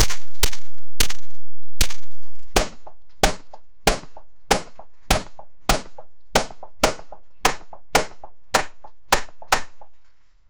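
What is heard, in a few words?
barrage of punch very fast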